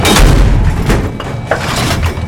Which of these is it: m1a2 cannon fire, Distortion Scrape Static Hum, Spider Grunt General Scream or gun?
m1a2 cannon fire